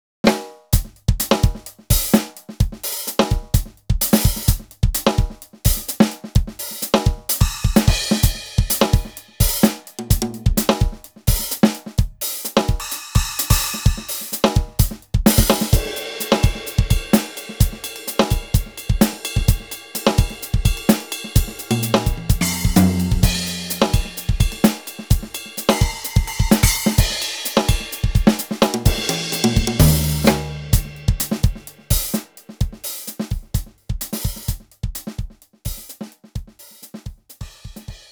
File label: splash; efnote 3